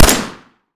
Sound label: Pistol 10mm Fire; ARMES